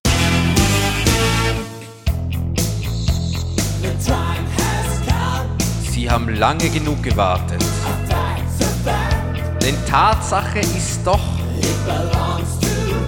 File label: mikunda rock jingle